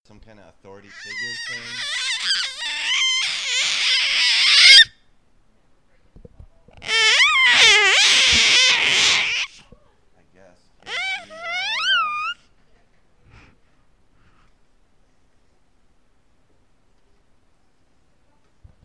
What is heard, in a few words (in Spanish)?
bebe